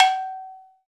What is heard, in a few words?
CowBells